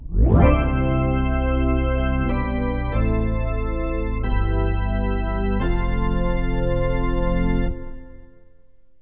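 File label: organ; Roland; An Invitation To The Roland World Of Music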